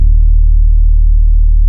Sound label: TAURUS E2, synthesizer; Hollywood Edge - Giorgio Moroder Rare Synthesizer Collection